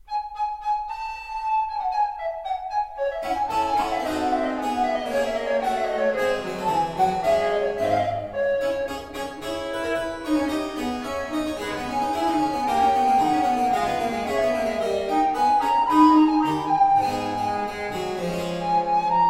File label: Sonata I In D Minor Fugue, Recorder and Basso Continuo; Francesco Barsanti; Sonatas for Recorder and Basso Continuo